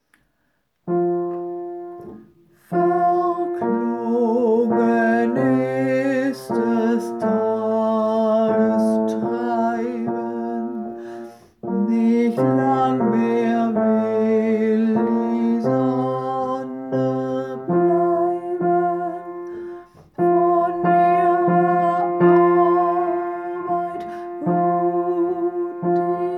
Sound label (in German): Bass, Volkschor